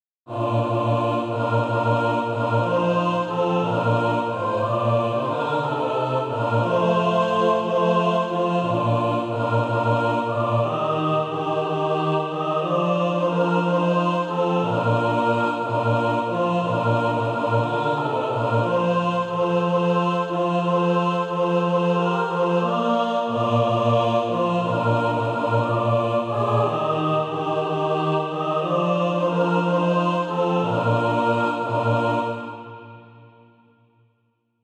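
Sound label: mixed, Practise Music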